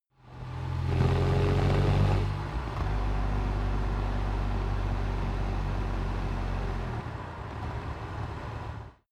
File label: sports car int engine accelerate decelerate long; verkehr-transport